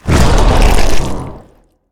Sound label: Limb Loss; Ravager